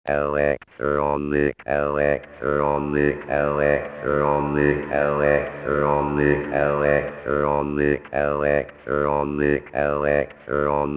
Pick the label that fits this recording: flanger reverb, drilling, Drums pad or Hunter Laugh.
flanger reverb